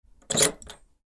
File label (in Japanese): ドアのカギを閉める; 日常生活的音效